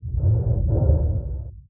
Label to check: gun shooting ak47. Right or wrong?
Wrong